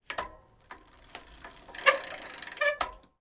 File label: transport bicycle pedal sprocket brake